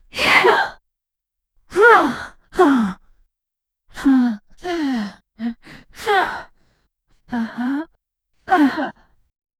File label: aggressive demonic female